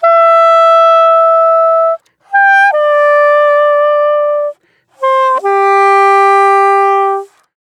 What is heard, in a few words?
Sop Sax